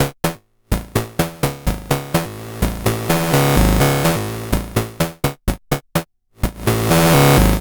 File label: 126 C m Synth